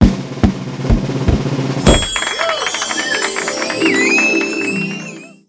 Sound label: lotto normal